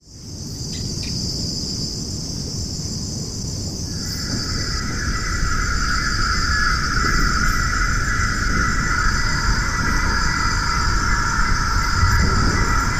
soundscape crickets

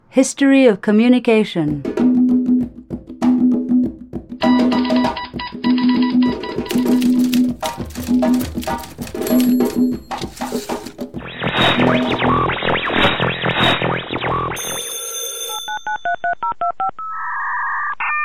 soundscape jingle music; history of communication

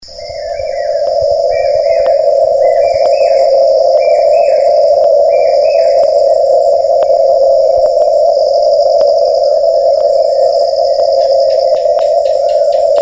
soundscape mix english

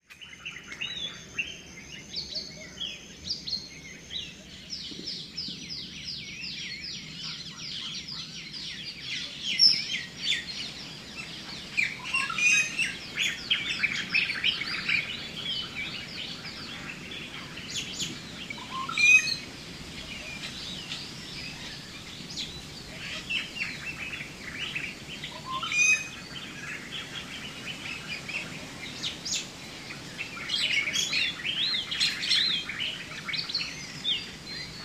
soundscape morning sound

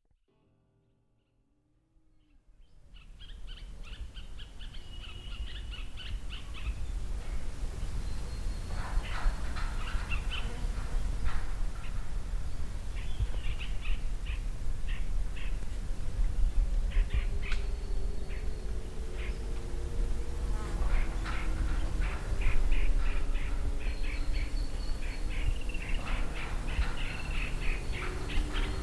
soundscape ambient pongal recordings

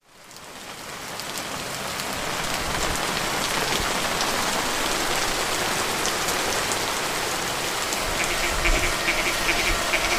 soundscape frogs rain

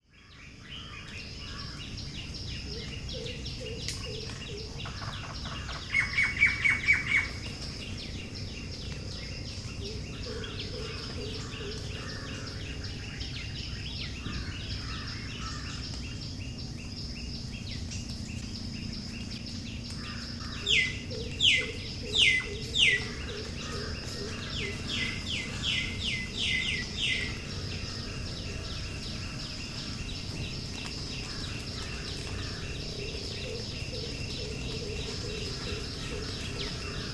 soundscape sunday morning blues

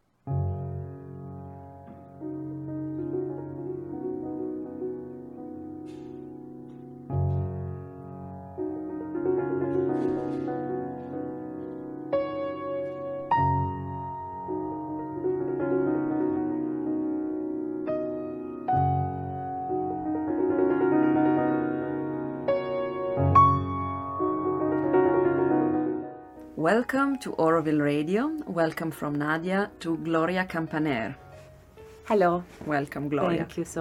piano concert

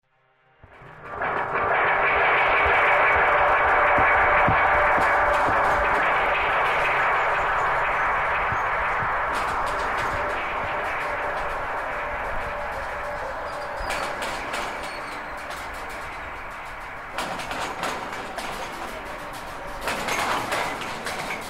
soundscape ambient transition recording; english

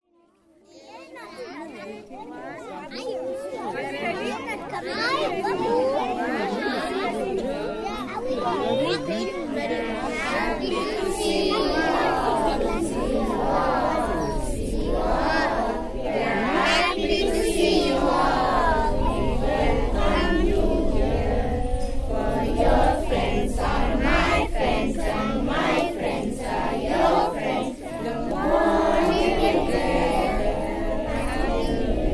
soundscape kindergarden open house; nandanam

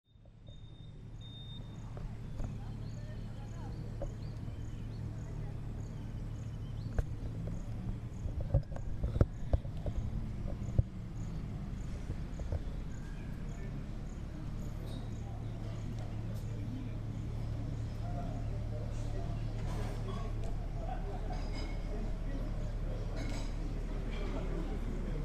soundscape townhall morning generator sound

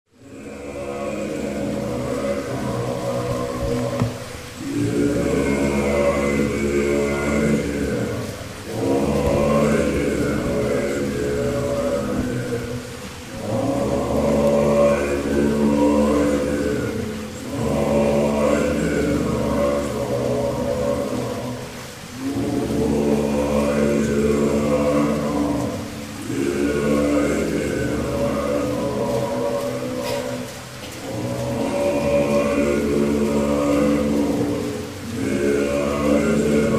soundscape tibetan pavillon new year prayer music

